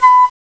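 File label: FLUTE; CMI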